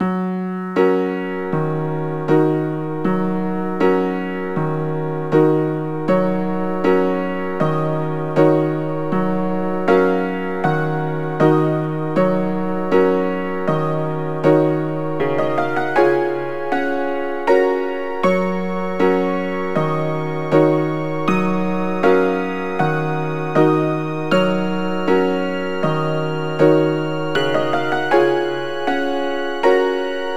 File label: Dance Chachacha Slow; knigge spielend zum guten benehmen; nds